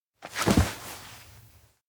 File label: hand on wall; anemoi